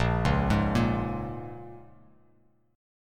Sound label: A sharp Minor 7th